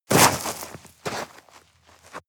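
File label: step gravel debris hard, footsteps; schritte; menschen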